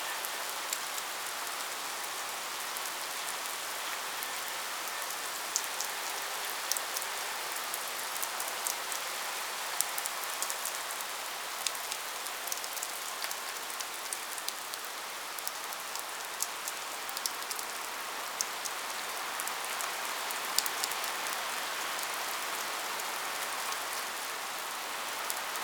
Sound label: Rising Blades Range Loop; Warframe; Warframes; Pax Duviricus